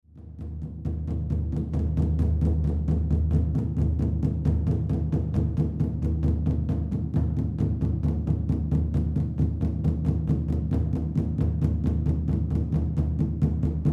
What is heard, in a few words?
drum, shamanic music